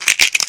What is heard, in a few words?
bones; lmctf